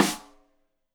Rim Shot Stereo; Deeply Sampled Drums; SMDrum

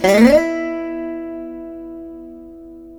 VEENA; Spectrasonics; Heart of Asia

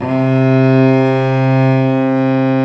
STR Vc Arco p

